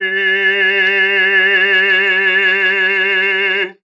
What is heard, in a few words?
heee, Voice